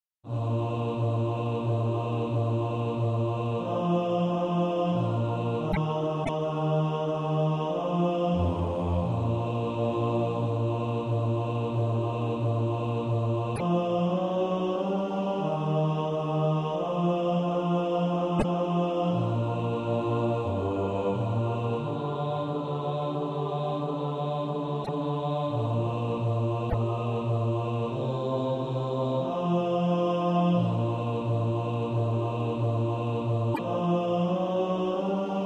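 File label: bass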